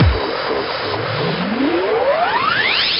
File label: Jingle Get Ready